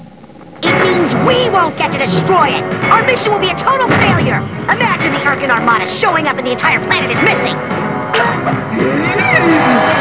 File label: oooooh